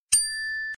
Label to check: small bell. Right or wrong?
Wrong